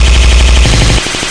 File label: machinegun, DRUM LOOPS and BREAKS, 181 bpm plus